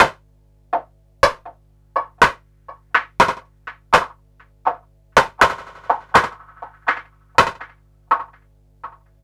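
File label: EAST snare drum, drum loop; Big Fish Audio; Uncivilised G'rooves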